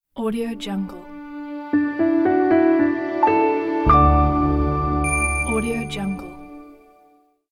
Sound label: logo in classic style